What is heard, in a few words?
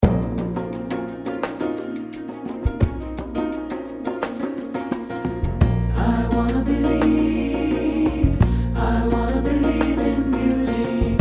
SATB